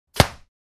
Arrow impact on wood

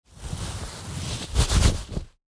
Silk bag manipulation material

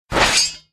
Battle axe scrapes against metal shield

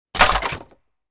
Cartoon accent crash broken wooden object

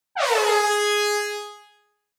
Reggae air horn G3 sharp

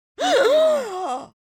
Witch fantasy vocalization sigh surprised

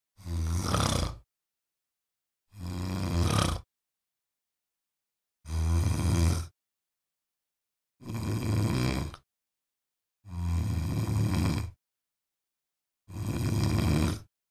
Female snoring

